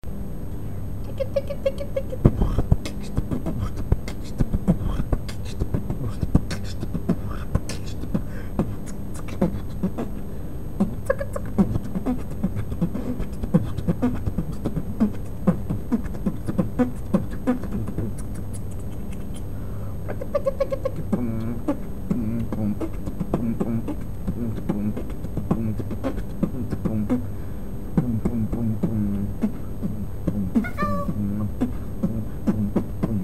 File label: beatboxing